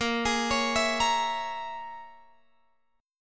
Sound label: A sharp Minor 7th Flat 5th A sharp, Guitar Open F; A#m7b5 arpeggiated; x,17,20,20,19,17